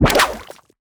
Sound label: Background Otter attack